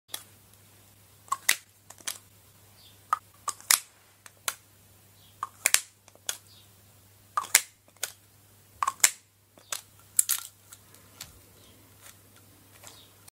Opening and closing lipstick

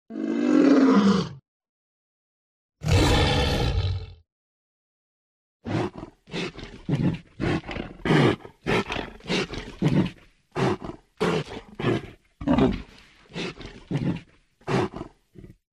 Liger

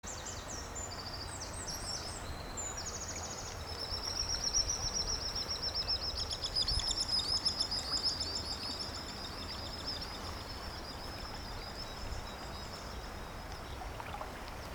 Sandpiper; آبچلیک

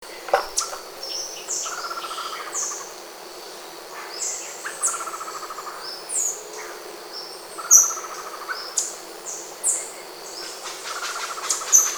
yellow backed tanager

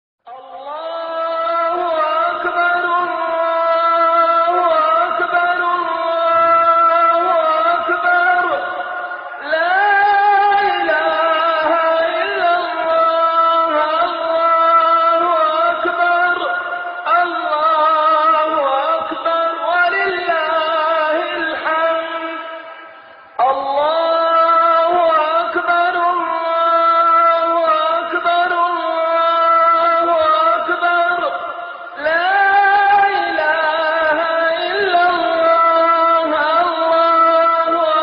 takbir, تکبیر عید فطر

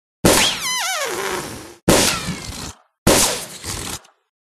Deflate

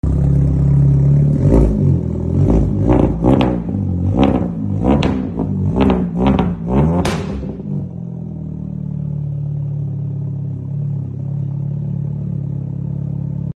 Golf Exhaust

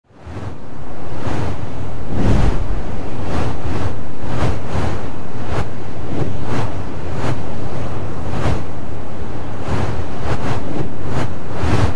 Timelapse; گذر زمان

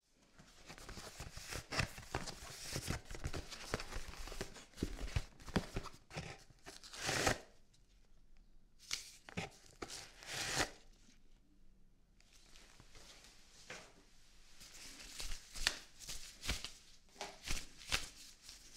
wearing gloves; پوشیدن دستکش

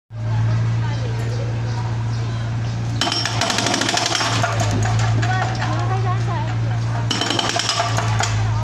Jack Hammer; پیکور برقی

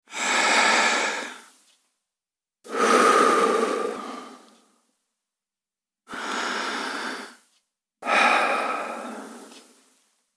deep breath male

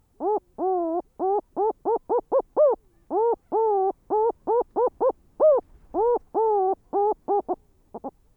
Blue petrel

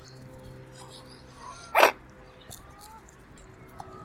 Mute swan; قوی گنگ

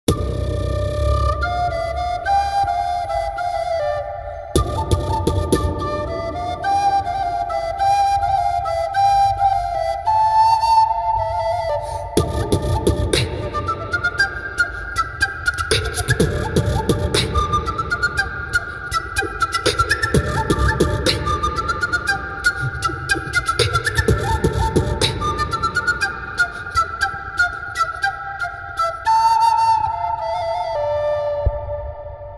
Flute recorder

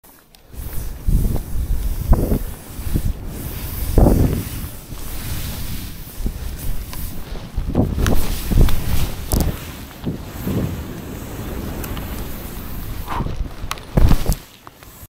Snow skiing; اسکی رو برف

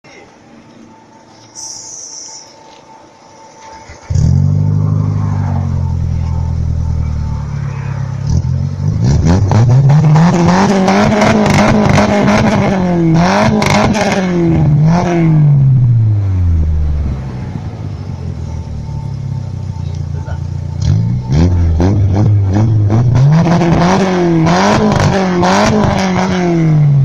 exhaust

